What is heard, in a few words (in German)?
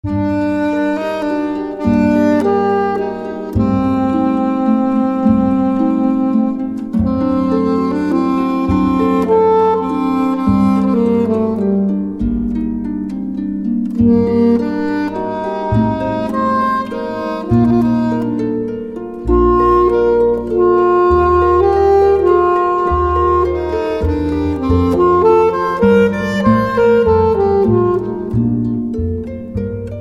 sicilienne altsaxophon